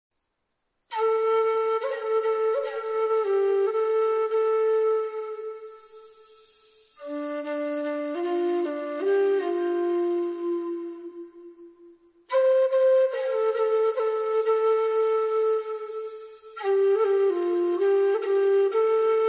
Native American Flute for Massage Meditation & Healing